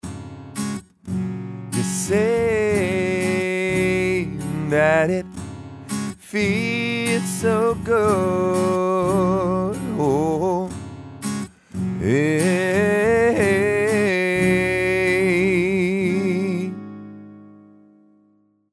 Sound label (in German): Audix VX10 Sänger